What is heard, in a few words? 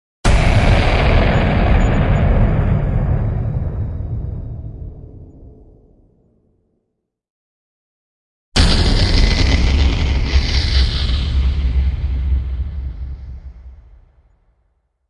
Impacts (Grundgy); Epic Tools vol. 1